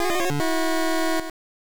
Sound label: Lose Slot Machine; Panic Restaurant; nes